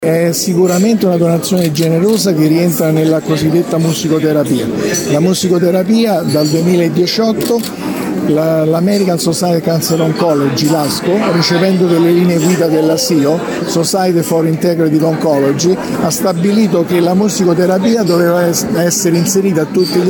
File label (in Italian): pianoforte